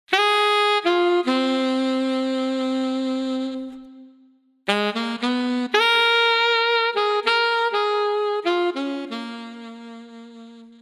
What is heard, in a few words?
jazz alto solo; ketron audya